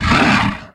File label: vox attack